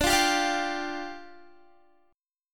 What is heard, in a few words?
D Minor Double Flat 5th, down, Guitar; Dmbb5; strummed